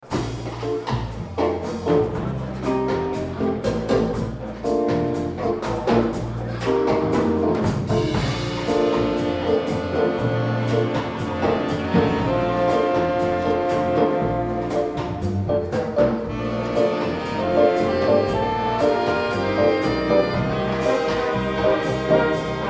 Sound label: Rhumba Medley; PenMar 2011